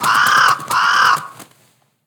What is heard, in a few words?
Messenger Raven Play